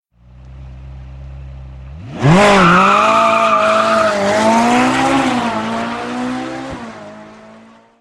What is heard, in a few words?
Ferrari 458